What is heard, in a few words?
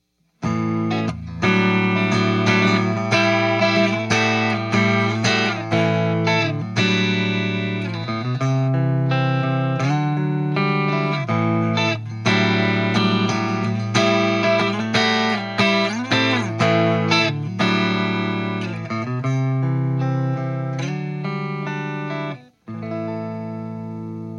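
les paul dark burst neck pickup plexi clean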